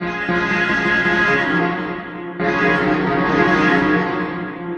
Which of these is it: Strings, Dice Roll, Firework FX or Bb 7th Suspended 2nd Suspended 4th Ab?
Strings